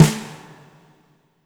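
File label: fat snare left; Spectrasonics; Burning Grooves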